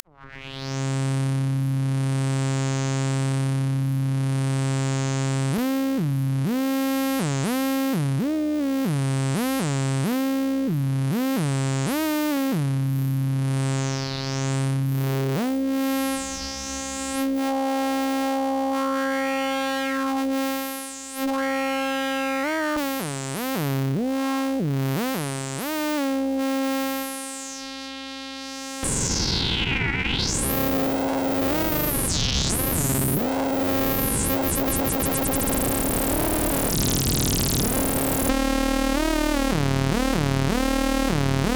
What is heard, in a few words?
Micromoog